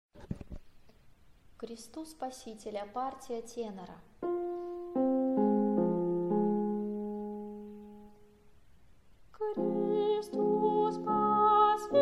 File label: tenor; K krestu Spasitelja